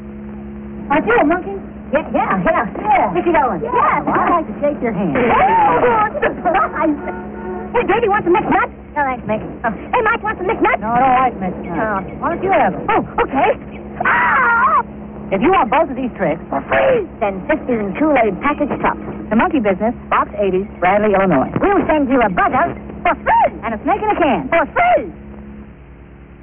buzzer can

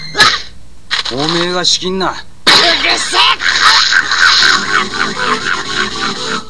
chew hair

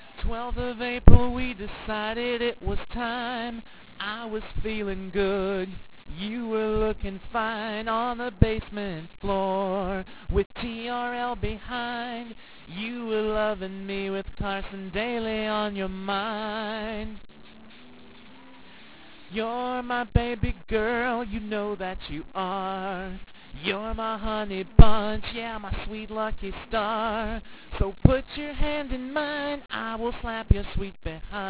karaoke

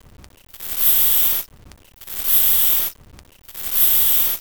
hissing, sugar glider

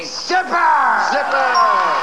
zipper; Match Game

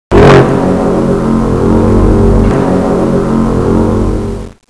horn

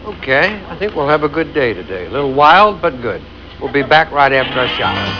Shower

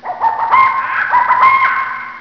owl, animals

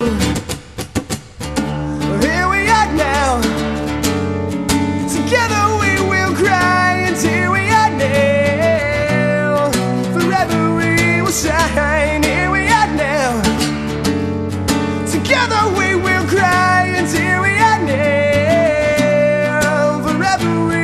break

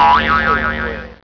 bounce

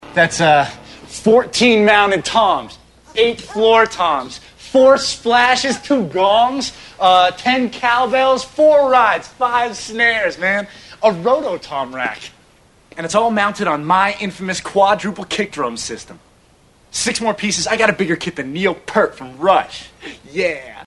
drumset; How big is Nick's drum set?; Freaks and Geeks

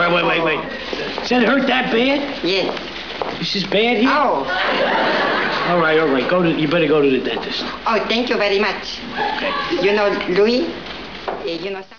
ouch

